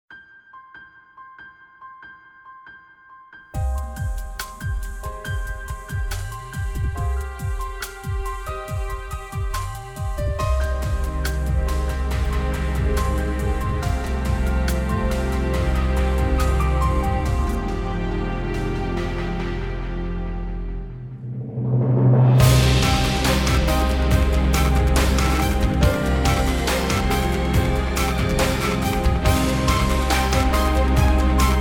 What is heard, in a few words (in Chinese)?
科技感大气, 背景音乐